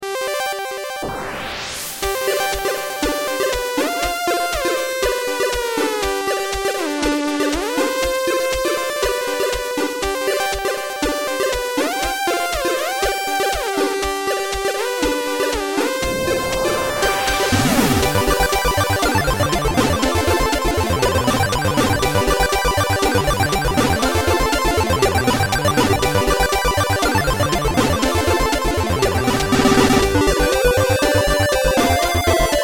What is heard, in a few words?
bubble machine